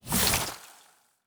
Nature Spell, Magic Sounds; Fantasy Sounds Bundle

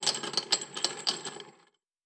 Chain; Fantasy Sounds Bundle; Craft

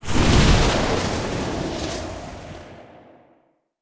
Wind Spell, Magic Sounds; Fantasy Sounds Bundle

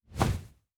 Wing Flap, Monsters; Fantasy Sounds Bundle

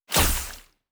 Nature Spell, Magic Sounds; Fantasy Sounds Bundle

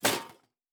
Armour UI; Fantasy Interface; Fantasy Sounds Bundle

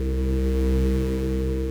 shield passive; Heroes of Hiis

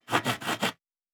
Saw Wood; Fantasy Sounds Bundle; Craft

